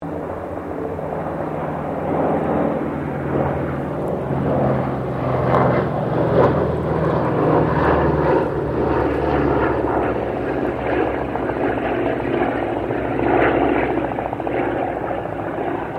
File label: AIRCRAFT UNKNOWN PROPELLAR SQUADRAN PASS